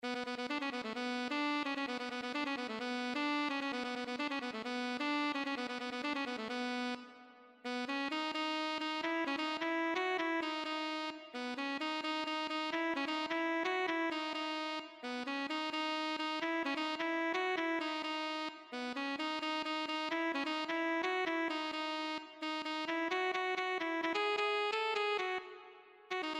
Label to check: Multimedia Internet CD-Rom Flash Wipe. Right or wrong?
Wrong